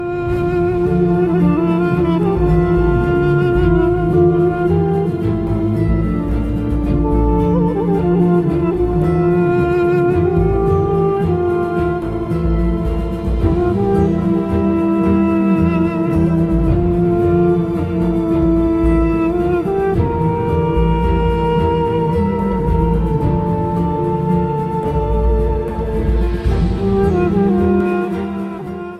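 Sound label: great violin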